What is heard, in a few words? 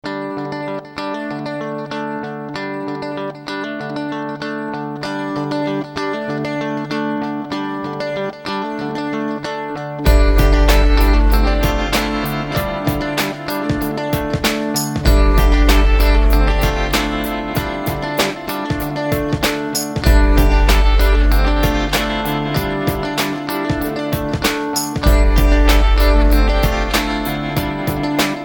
Glass Guitar